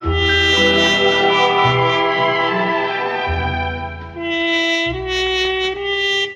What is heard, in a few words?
horns, jazz loops